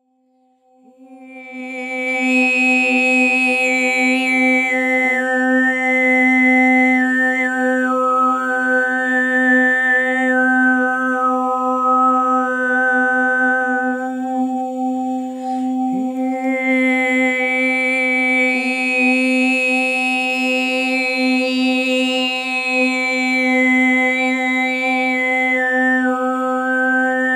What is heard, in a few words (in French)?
technique chant diphonique